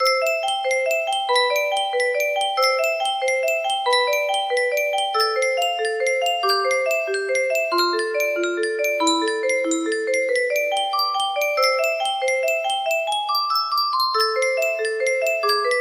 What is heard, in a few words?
musical box variations